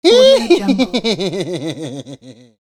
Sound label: crazy laugh